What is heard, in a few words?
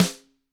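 Gretsch snare drum rim; ILIO; Double Platinum Drums 1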